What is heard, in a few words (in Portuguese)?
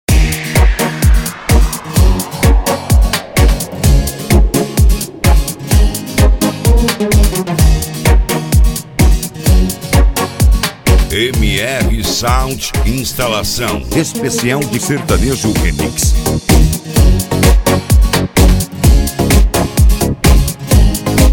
sertanejo remix